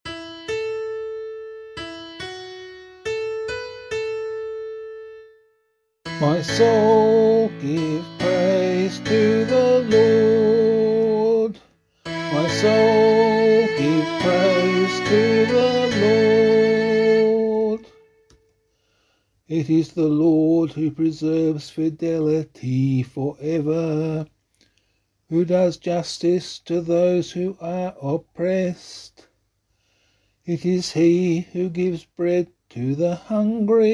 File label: Psalm, vocal